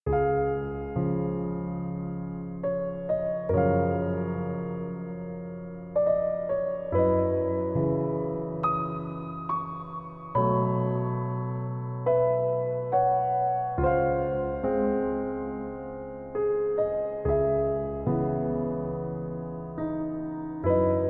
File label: Campfire; Scarlet Hood and the Wicked Wood